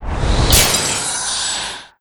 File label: ice missile; Pc Skill; FlyForInsanity